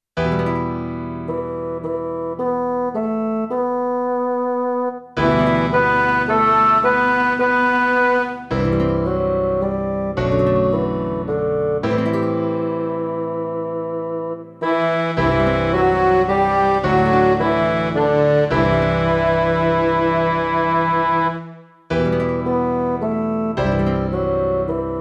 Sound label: preface dialogue midi